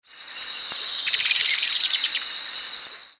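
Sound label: Pogonotriccus eximius; Itaipu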